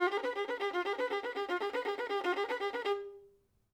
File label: violin